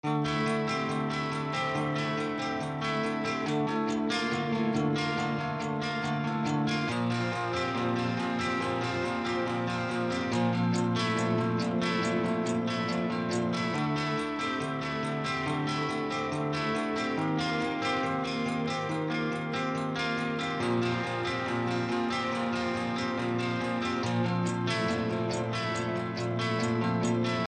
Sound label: 140 slice of life guitar